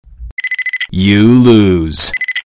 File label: lose